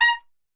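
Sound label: A#3 trumpet shot, Brass Super Section; Best Service; TRP SHOT; 90 s Sample CDs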